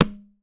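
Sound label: INDIAN MIRD, ETHNIC SET; MIRDANGAM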